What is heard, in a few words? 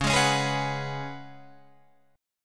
C sharp 7th Suspended 4th C sharp, Guitar Open F